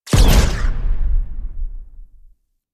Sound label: Flak Cannon Alt Fire Explosion; Warframe; UT Flak Cannon